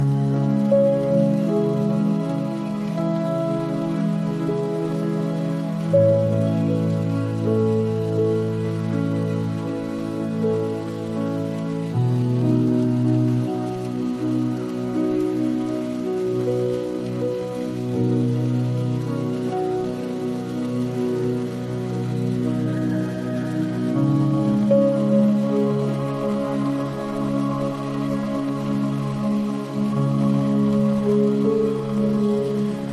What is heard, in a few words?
healing music